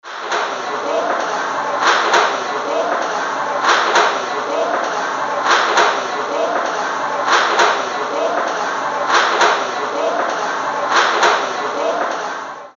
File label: Cantonese Saw; Prince